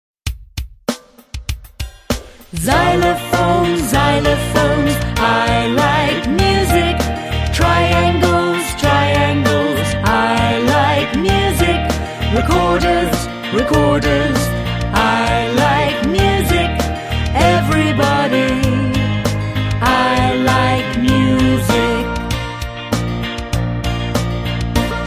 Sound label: the drums song; обучающая песня; Песенка для изучения английского языка